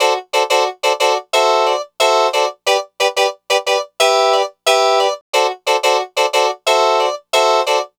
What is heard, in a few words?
Lounge Hrpsikrd-D; Zero-G Kocktail Kollection; Construction Kitz (Lounge); Welk Lounge 090